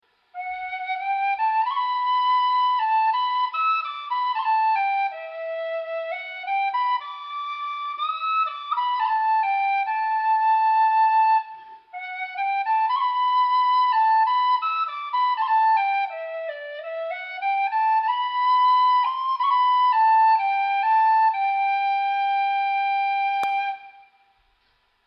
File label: susato oriole d